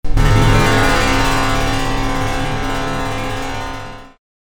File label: Big Plate Seq